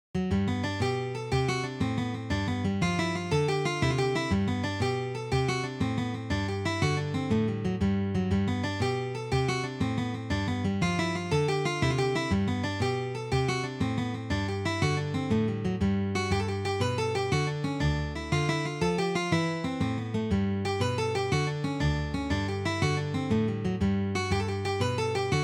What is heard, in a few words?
Jig